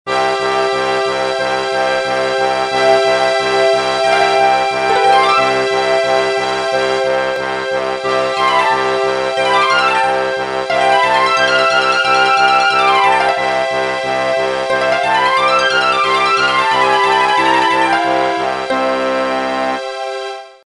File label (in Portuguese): piano musical abertura